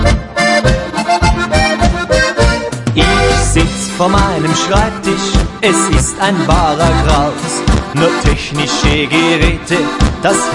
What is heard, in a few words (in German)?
boarischer